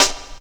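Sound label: snare drum, Drum & Bass; Ueberschall; Drum & Bass Resonance; 150 BPM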